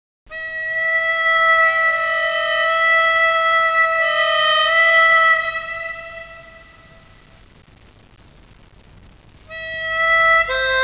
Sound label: harmonica; quake2